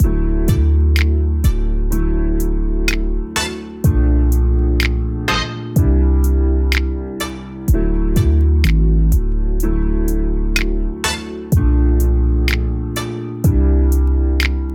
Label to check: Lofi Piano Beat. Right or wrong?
Right